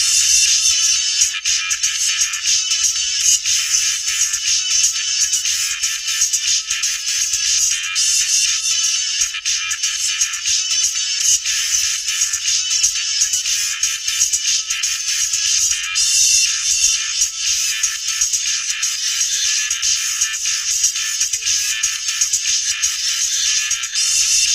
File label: Ringtone; Nokia 216